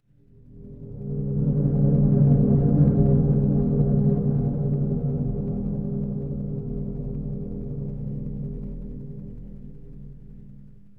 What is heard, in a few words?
horror stinger